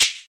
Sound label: Original Shaker Hi Hat E Key, Royality free shaker percussion tuned to the E note.